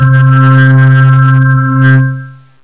hammond g2; Zoom - Release 2 (1996); Active Software; synths

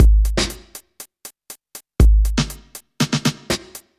drum; widdagroovebox

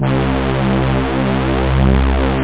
bass string

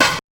snare, Drum+Bass, 160

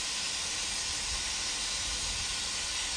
steam; KINGPIN

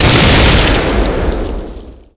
building destruct; specops

